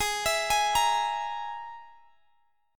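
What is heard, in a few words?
Ab Suspended 2nd Sharp 5th chord, Guitar, Standard tuning; x,x,18,21,21,18; arpeggiated; JGuitar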